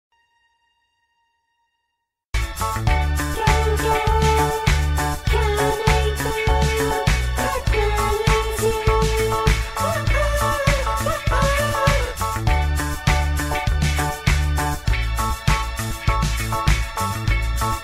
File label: Disco Girl